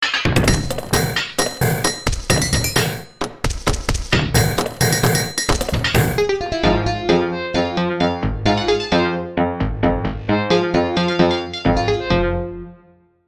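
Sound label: fun factory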